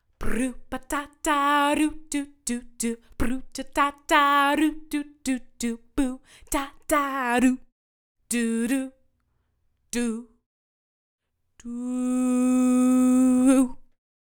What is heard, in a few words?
Vocal Snippets